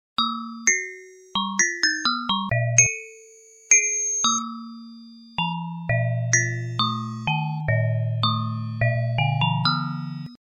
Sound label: bandpass rez